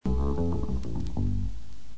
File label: contrabass